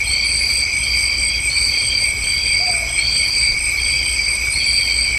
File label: forest at night sounds